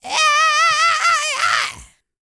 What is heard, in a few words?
YELL, MALE HUMAN; Spectrasonics Vocal Planet